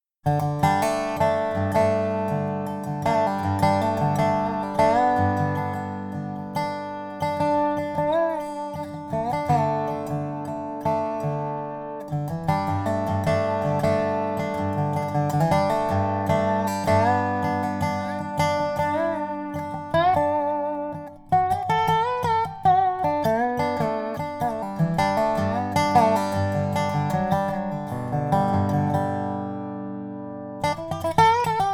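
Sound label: Sound Sample Maple, Custom Resophonic Guitars; Mistr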